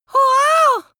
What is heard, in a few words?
amazed boy